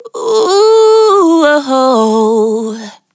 vocal syllable one shot "ooh oh" adlib dry 128 Amin